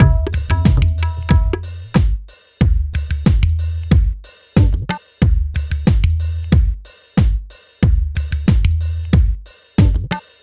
Beat, Hip-Hop

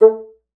bassoon, A3; Xsample VOL-6

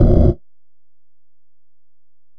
EPerc, Alesis DM-PRO; 300 Drum Machines

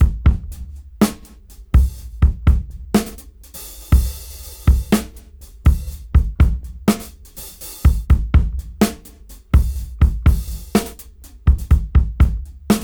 Funk 61 BPM; AMG; Keith LeBlanc Phat Butt Jams

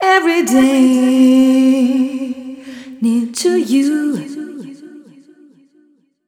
f mel g, fem Vocals

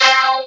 VOC HIT, VOCODER; Zero-G Ambient 1

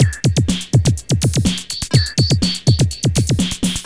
LOOP 124

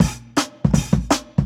163, Jungle Breaks; Original Jungle Breaks

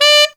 TENOR D; Zero-G; Phantom Horns

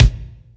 kick, Drums & Perc; AKAI S6000 CD-ROM - Ultimate

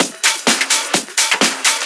Discohouse Loop 128 BPM

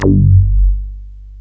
MOOG, OLD SYNTHS; Rubber Chicken Software Sample Bank

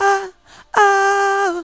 SUNG; AMG - Vince Clarke Lucky Bastard

